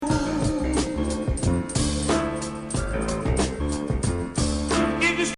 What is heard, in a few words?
Synthetic 2nd Drums Bass Keys Loop